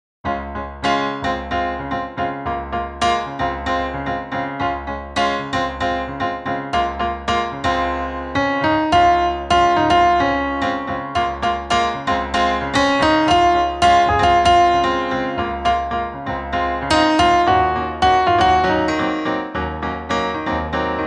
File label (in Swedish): Samba instrumental; Dibber AB